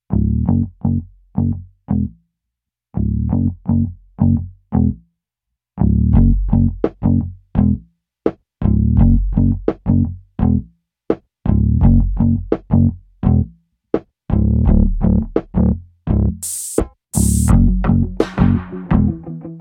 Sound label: (Instrumental)